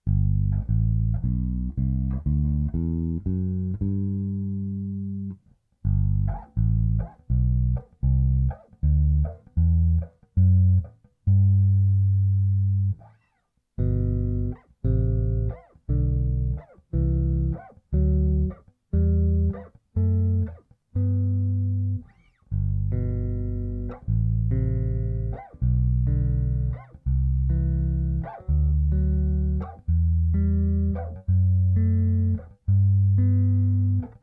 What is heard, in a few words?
Harmonizing the major scale